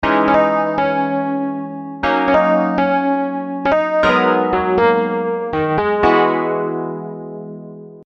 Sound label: HARMONY w MELODY